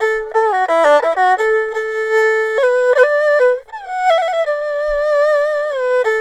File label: ERHU, 80; INIS - Opium